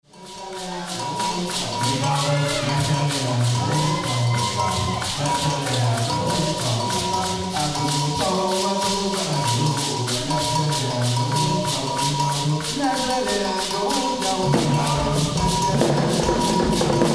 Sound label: african Drums